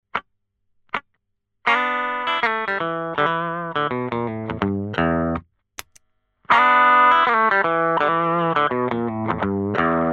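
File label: Without / With Sardine Can (Compressor + Rectifier + Color Brown), guitar; Celmo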